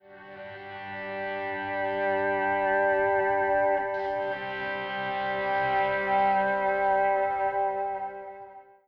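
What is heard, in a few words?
Country Guitar Intro; Digidesign; Pro Tools Essential Loops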